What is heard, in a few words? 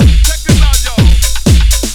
LOOP; SoundFactory; DRUMLOOP